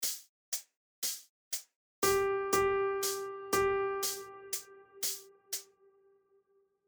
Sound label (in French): Syncope Binaire